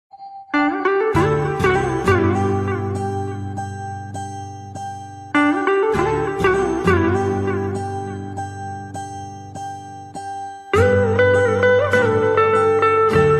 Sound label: telugu bgm ringtone